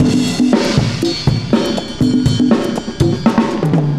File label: cooling 120, loops, 120bpm